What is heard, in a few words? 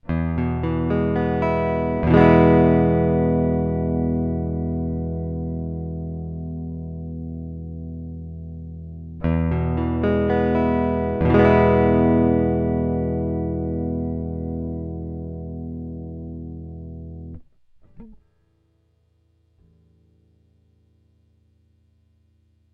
Pattern without electronics, StockPickups